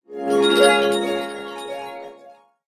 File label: new map level; 摇钱树